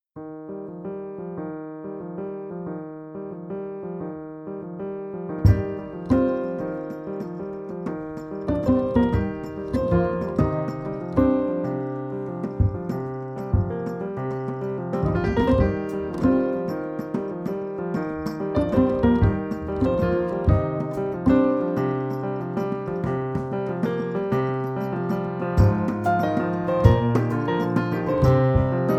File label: Drumsticks and Fingertips